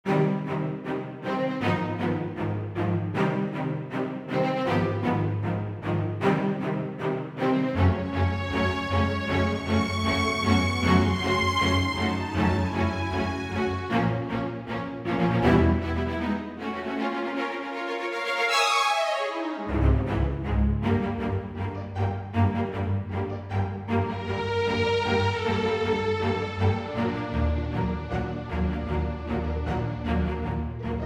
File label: Epic Orchestra soft lyrical vib